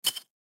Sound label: Snow Bottle Set Down; Snow Bottle Set Down Hard; Ice; Weather; Ice Sound Effects; Blastwave